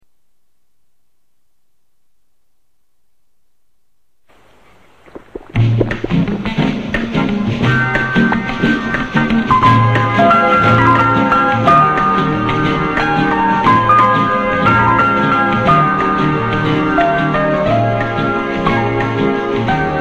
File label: motor; Lány a motorkerékpáron; Alain Delon